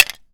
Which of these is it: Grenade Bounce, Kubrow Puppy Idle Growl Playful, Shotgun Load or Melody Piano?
Grenade Bounce